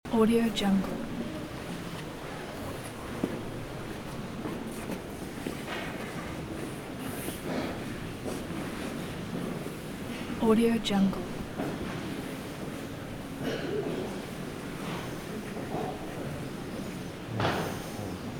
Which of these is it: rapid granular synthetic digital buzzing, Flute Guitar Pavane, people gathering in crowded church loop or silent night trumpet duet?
people gathering in crowded church loop